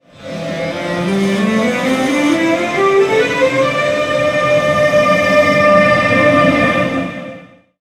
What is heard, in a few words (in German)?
Taurus Tonleiter